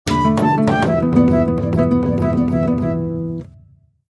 Aztec Music Short; Civilization Revolution; Xbox

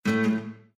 Critical Stop; Heritage

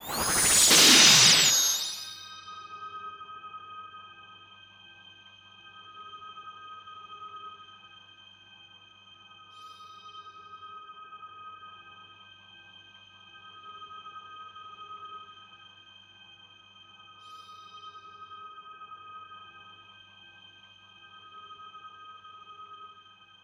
light blow away

